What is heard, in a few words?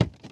plaster, footsteps, run; boomer